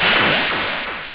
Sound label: ufo, drums